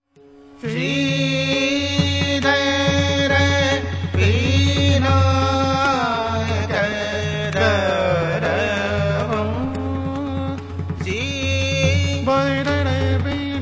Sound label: Dhrupad; World Sounds